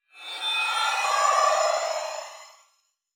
Teleporter Abduction Success; Evolve; Wraith

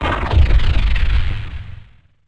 Teleporter Abduction Teleport Back; Evolve; Wraith

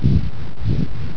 flap big; Jaminporlants

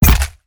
Teleporter Melee Impact Base; Evolve; Wraith

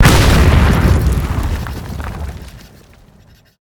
Explosion; Evolve; Gorgon; Lurker; Mimic

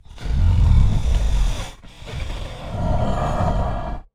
Stealth Breath Idle; Evolve; Goliath

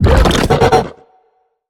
Tongue Grab Tell; Evolve; Behemoth

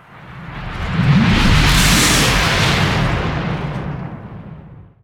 Lightning Strike Activate Start; Evolve; Kraken

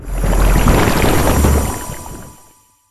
Stellar Slime Reform; Servants of Necroterra

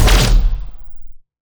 strider minigun; Strider Remake

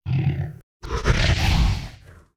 Rock Throw Grab FLAME; Evolve; Meteor Goliath

